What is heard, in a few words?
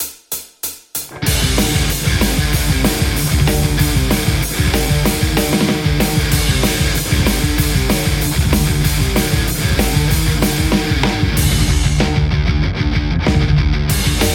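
metal Stratocaster; overloud; th2 fullmetal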